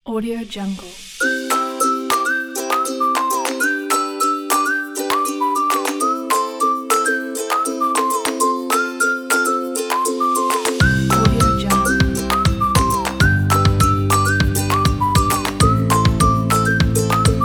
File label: cheerful